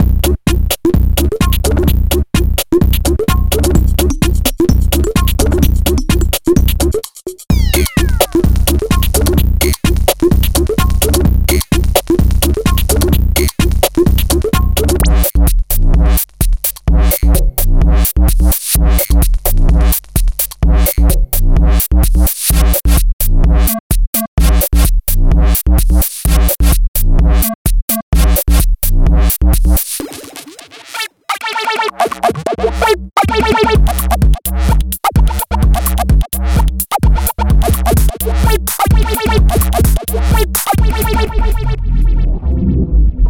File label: Advanced Minimal Techno; Bluezone